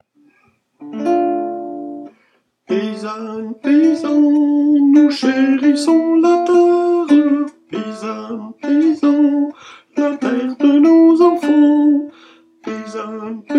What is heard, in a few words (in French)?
paysans tenor; repertoire chorale